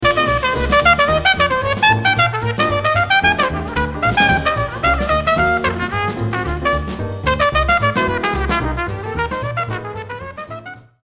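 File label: trumpet solo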